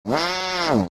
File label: loop fart boy